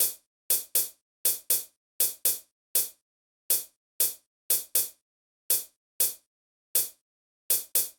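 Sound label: chh lax, closed hi hat; hydrogen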